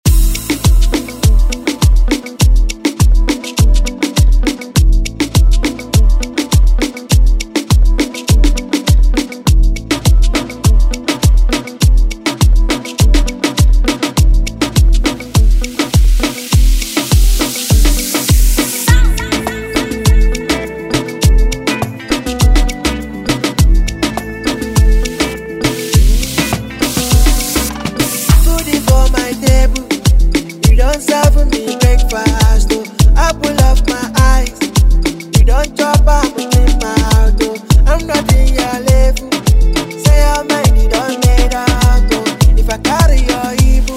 Afro Moombah; Redrum

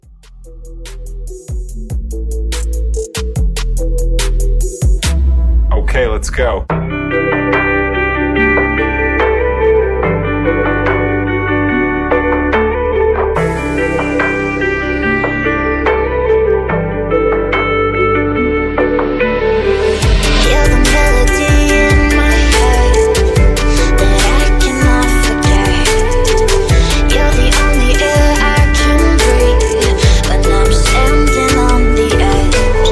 Pop Trap Dubstep 144 BPM

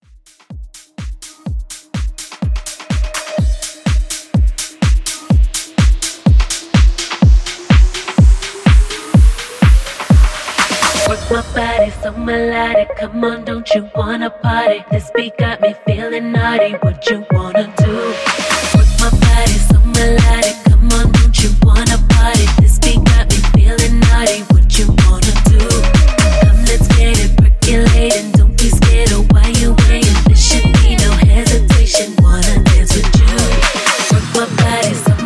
Tech House Intro

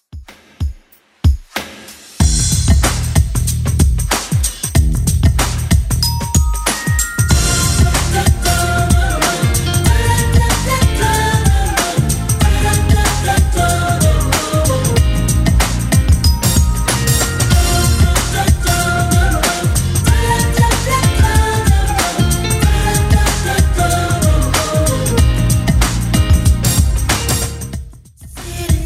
90s Redrum 94 bpm CLEAN

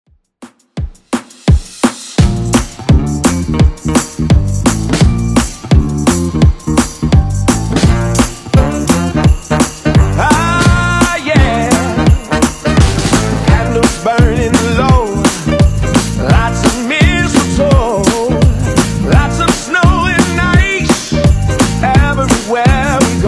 Christmas Redrum 170bpm

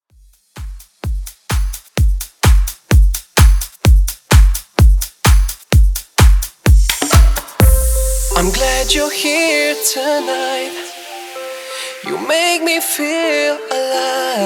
Electro Re drum

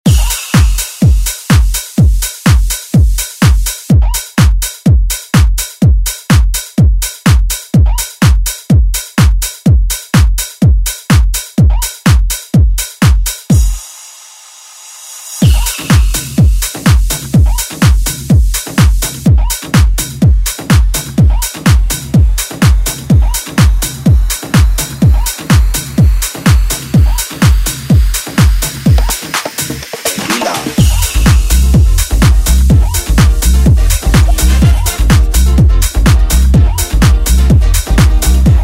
Tech House Edit; Deejay Hit; Redrum; remixplanet

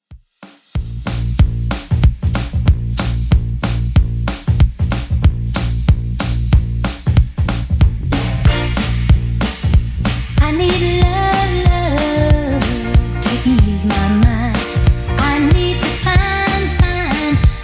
60s, 187 bpm; Redrum

